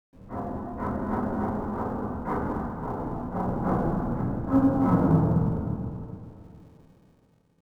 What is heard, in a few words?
metal stress, cargoliner; Soldier Of Fortune II Double Helix; XBOX; ambience